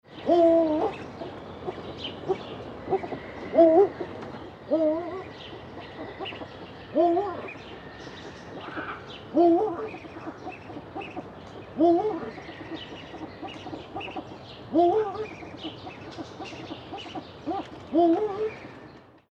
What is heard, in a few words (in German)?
Uhu Lautfolgen; Tierstimmen